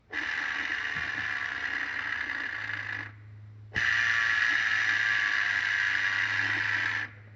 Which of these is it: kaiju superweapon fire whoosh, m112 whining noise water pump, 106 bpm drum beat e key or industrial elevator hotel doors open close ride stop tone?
m112 whining noise water pump